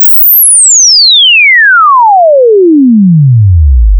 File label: sweep reverse long